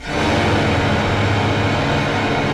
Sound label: Motor Room